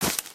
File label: step bush, human